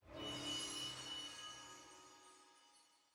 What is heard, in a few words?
action button forward hover; clash